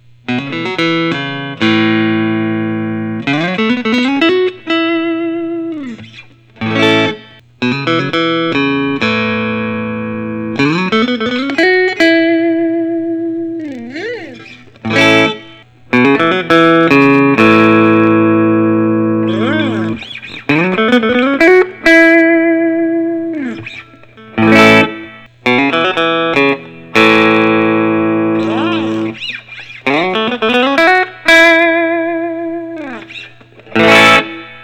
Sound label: fender custom shop american deluxe tele